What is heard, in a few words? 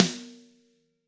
Snare Crack; Drums; apulSoft apTrigga2; Steven Slate Drums